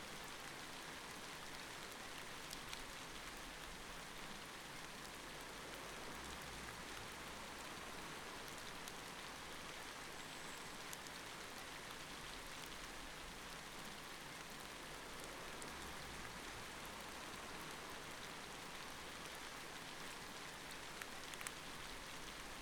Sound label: Light Rain; LOOPERS